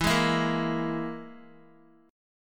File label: E-Major Flat 5th E, Guitar; strummed